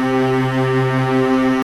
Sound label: STR C2